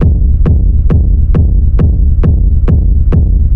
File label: techno sub kick black; drum loops